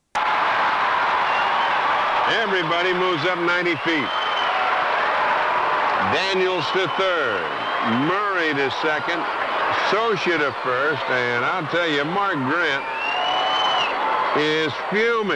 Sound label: Crowd Cheer OOOHH Outdoor Baseball Stadium, Lar